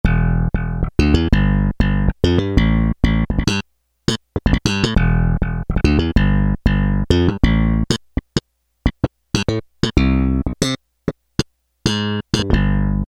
Slap Bass 2, Roland W-30; Factory Performance Patches

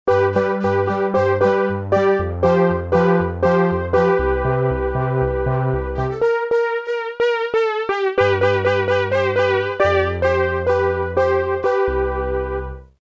JP Sub Synth; Roland M-DC1

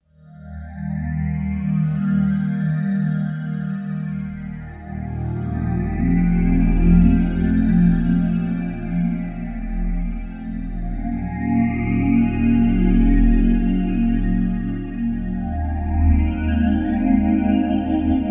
Kawai K5000S; factory presets; Waveswim

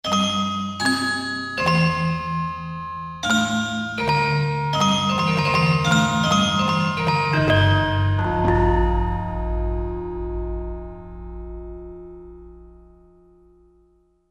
GAMELAN ORCHESTRA HITS; Spectrasonics Heart Of Asia

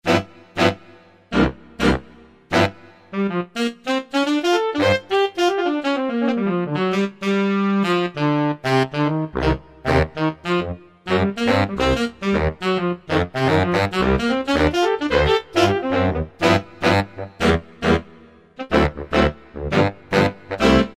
Sax Band, Korg N364; Factory Combi demos

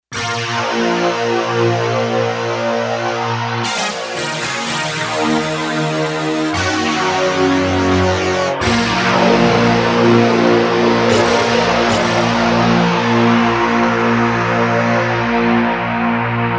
GTRs; Digital Synthesizer

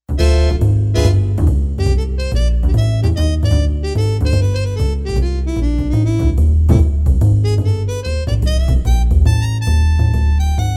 JAZZY JAM, synth

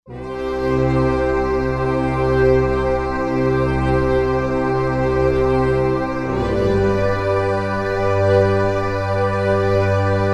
Going Down, synth fx

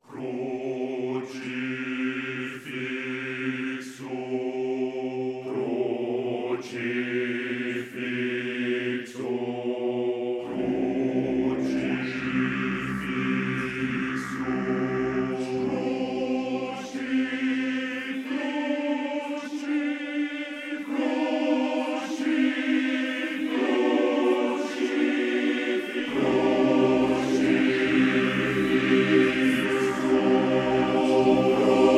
Choirs

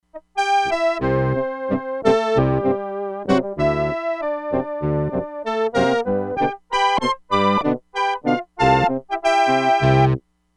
REEDS, Crumar Bit 99; Factory Programs

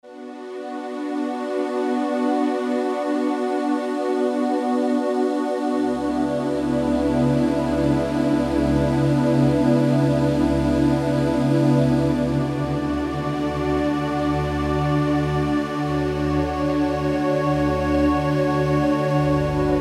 Slow Pad

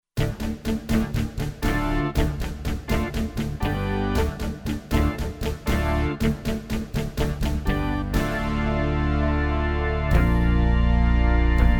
Touch Rock Band, Kurzweil K2500X

